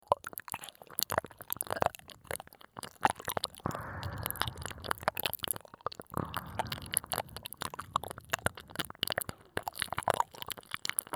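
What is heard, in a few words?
both ears licking only; 04両耳舐めのみ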